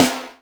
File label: snaredrum, Yamaha RM 50